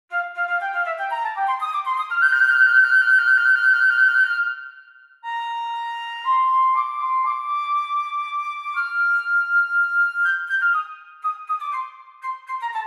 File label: Flute